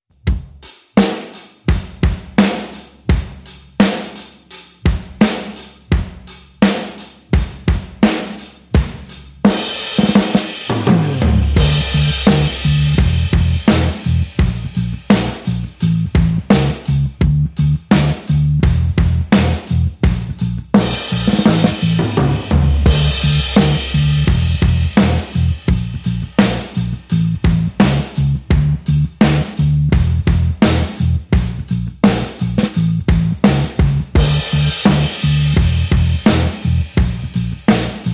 Drums and Bass Mix

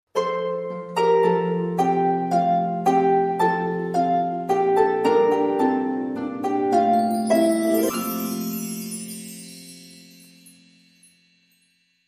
Harp Deck The Halls

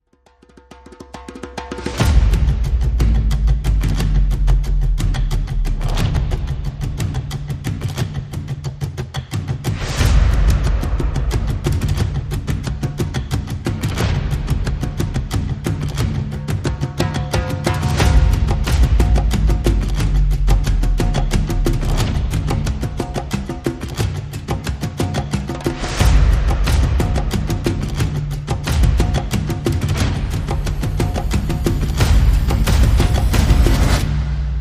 Trailer, Percussion and Effects

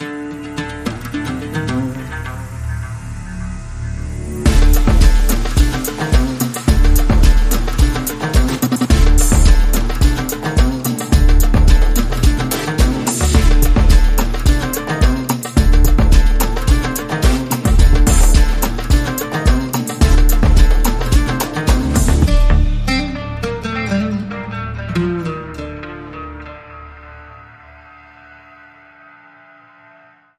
Arabian House

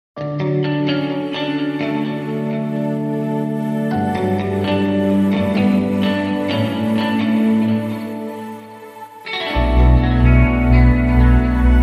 Heading Home, No Drums

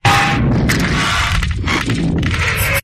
Radio Glitch

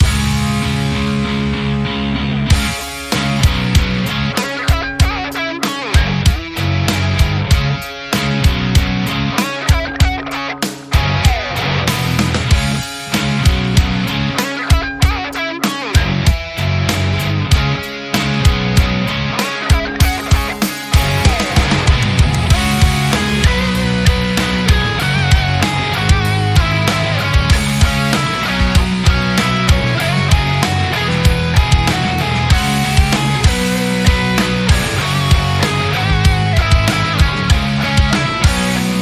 Arena Rock

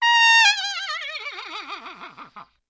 Horse Whinny

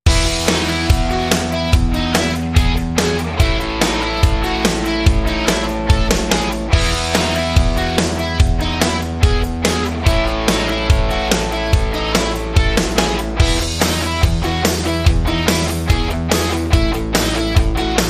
Enjoying Life, No Lead Synth

